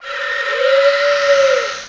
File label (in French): Fantome